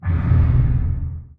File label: distance laser fire, weapons; Stellaris